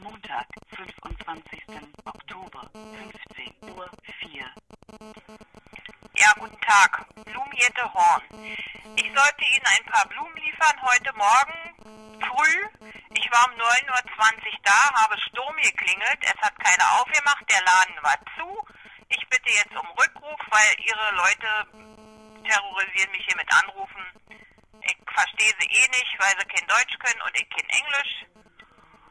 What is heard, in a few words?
horn; blumehirte